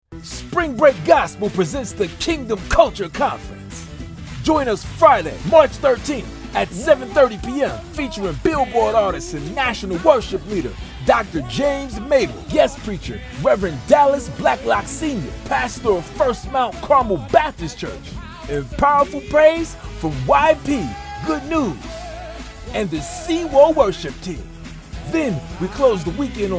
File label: Gospel; Spring Break